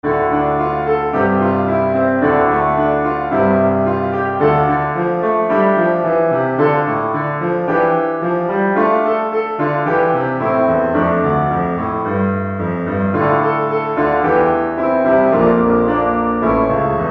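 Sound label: accompaniment; Worship in Spirit Truth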